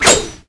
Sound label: SWORD